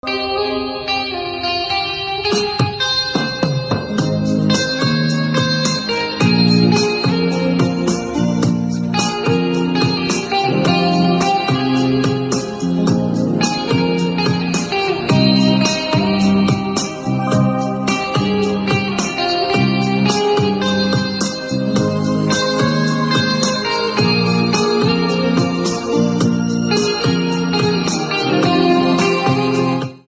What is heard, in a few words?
Guitar Instrumental Ringtone